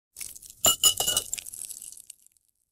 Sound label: sound effect of ice spike; boss